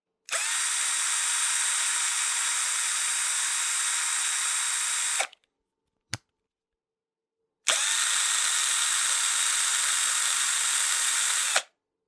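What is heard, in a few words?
sound of screwdriver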